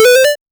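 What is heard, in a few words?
sound when score is decreased, 8 bit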